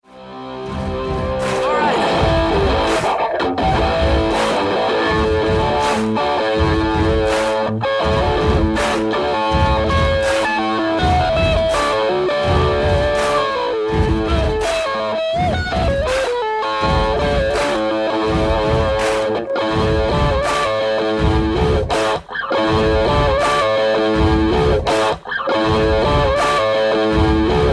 guitar cover

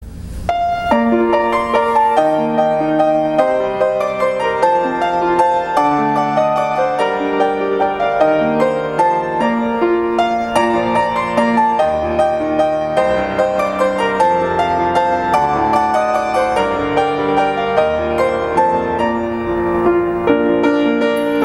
Piano

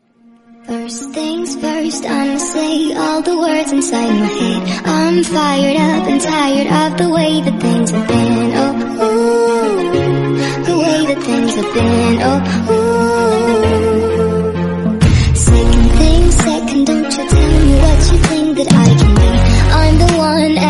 Female Version

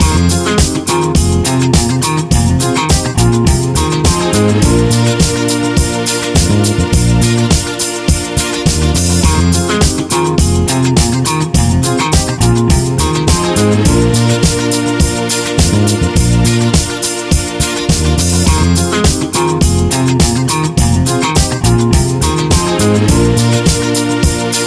Disco

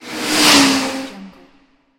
transition

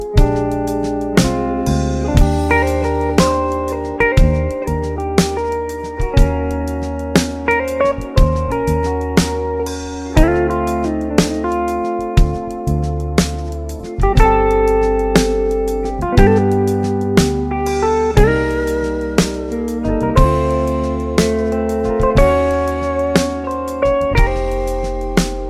Warm Jazz

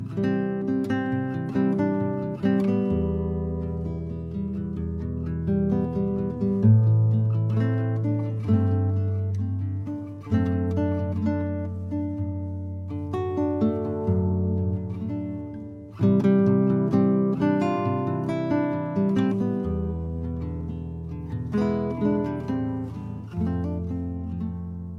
Acoustic

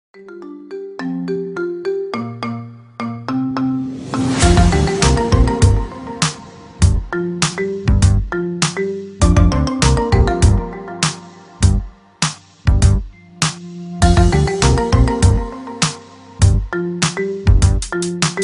marimba remix

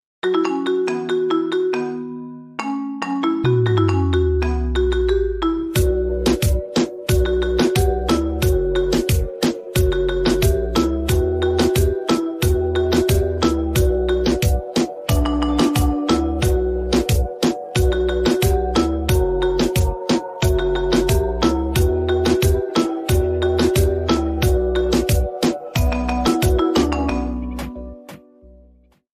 Marimba

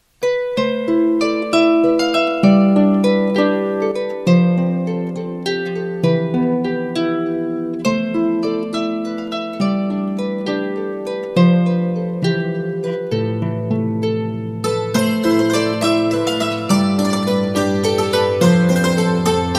Classical Medieval; Greensleeves

